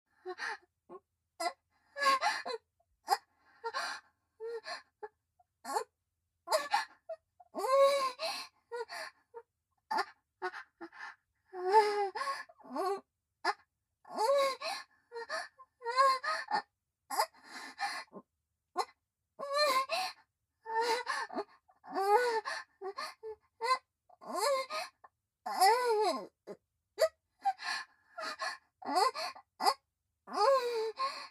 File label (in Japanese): 右斜め前・喘ぎ声・普通; リーシャ